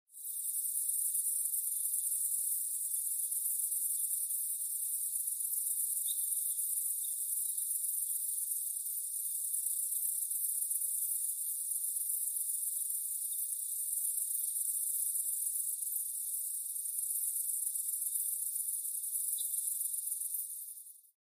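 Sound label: Crickets Ambience Day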